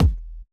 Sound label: kick, Roland MC-909